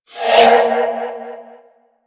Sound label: Spirit Primary Fire Whizzby H; Warframe; Grineer Thumper Bot; Sentient Spirits